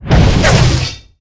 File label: burst